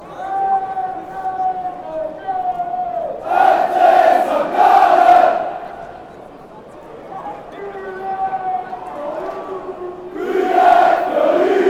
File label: shouting, fanchants